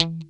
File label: mute E2, strat; InVision Interactive; Lightware VOL-1; Instruments & Percussions